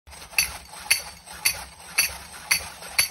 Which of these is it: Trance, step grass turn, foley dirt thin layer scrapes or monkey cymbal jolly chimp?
monkey cymbal jolly chimp